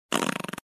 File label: Fart like sound